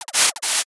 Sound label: zipper open; daily life